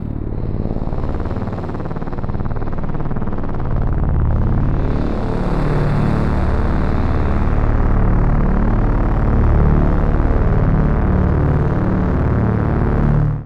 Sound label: Long Growl, Drum & Bass